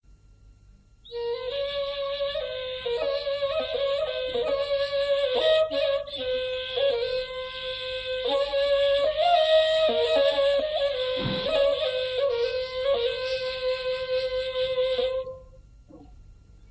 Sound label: ney